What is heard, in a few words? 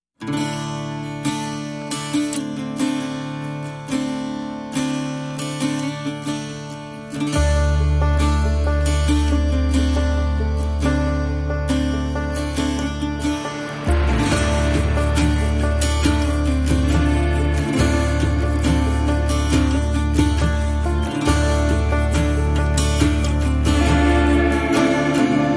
No Drum Kit